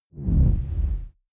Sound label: noise buzz